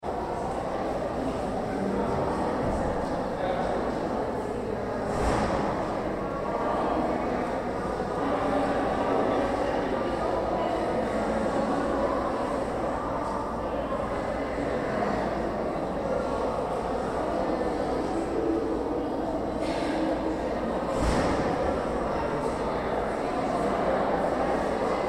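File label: Crowd Women's Conference Lobby Far; Backgrounds; Crowd, Int, Women ' S Conference, Lobby, Far, Lite, Bg Doors; Crowds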